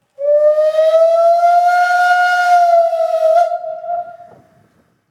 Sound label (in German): Klingelton Dampflok